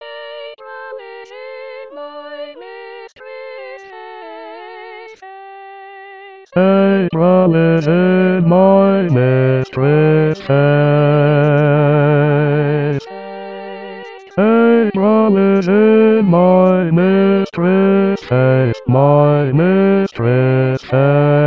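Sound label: April is in my mistress face Bass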